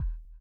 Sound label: SONAR R; Spectrasonics; Metamorphosis